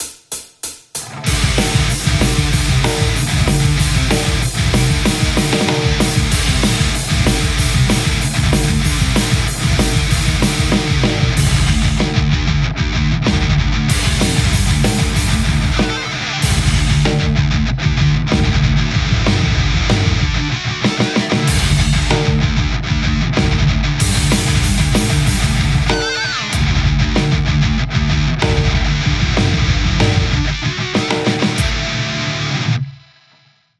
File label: metal les paul